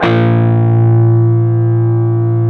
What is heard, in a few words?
PRS PWR B2E, Guitars; PRS PWR CUTS